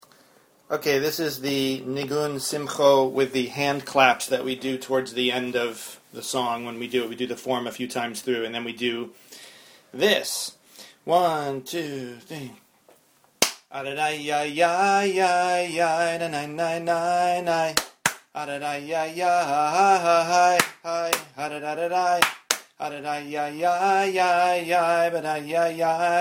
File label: hand claps; niggun simcho